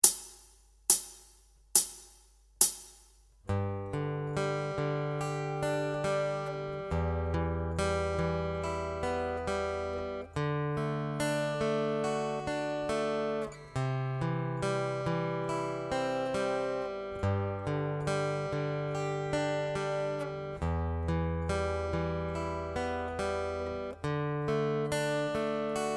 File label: Chord Pick Pattern